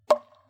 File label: Baseball Hitting Bat